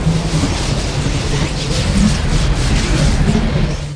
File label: ice; DAoC; Toa; Gamestar